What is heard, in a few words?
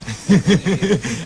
hihihihi; kemal sunal